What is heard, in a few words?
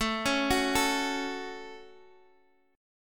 Gb Minor A